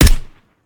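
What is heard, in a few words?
hk45 shoot suppressed; tacz; daffas arsenal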